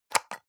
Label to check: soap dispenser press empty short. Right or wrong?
Right